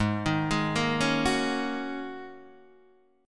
G sharp 7th Suspended 2nd Suspended 4th G sharp Guitar Open D